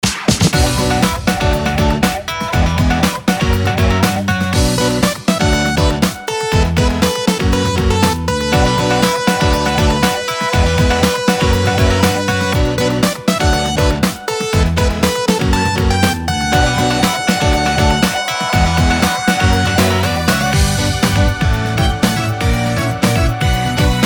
Music Event

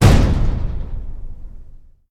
Remote Only Door End Close; Prison Architect

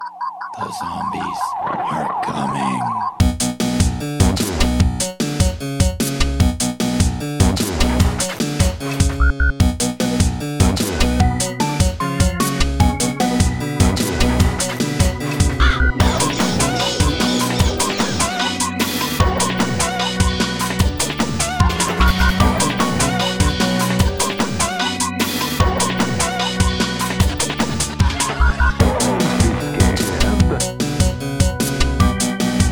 (Spooky Mix) (Instrumental); Pico

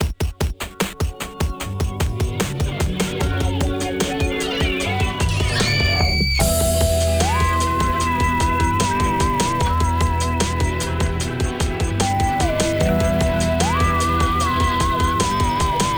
Pause Menu; Spooky Kids

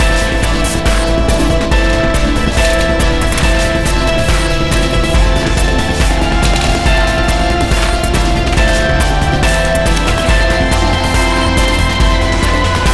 Trainride Stationary Fast; rive wreck hack die retry; ps4

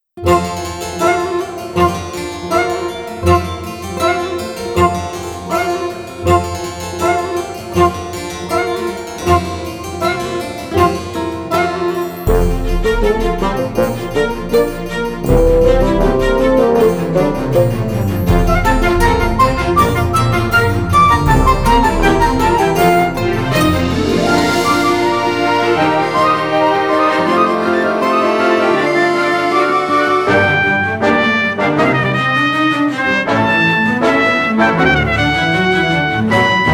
Medium French Port; Pirates of the Burning Sea

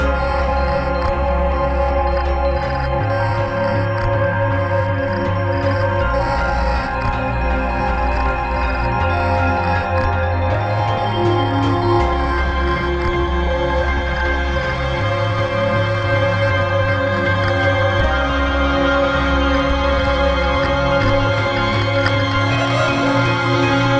Waterworks Murky Slow; rive wreck hack die retry; ps4

